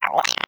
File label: idle chirp, headcrab, creatures